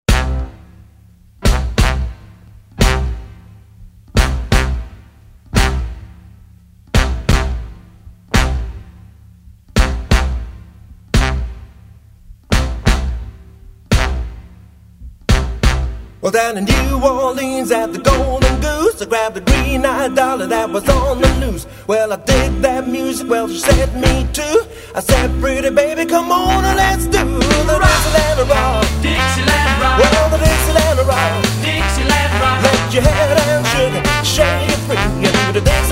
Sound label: dixieland rock